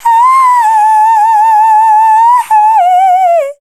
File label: Dance Vocals, FEMALE